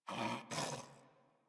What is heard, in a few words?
Pet Snore; Warframe; Catbrow; Pet Ship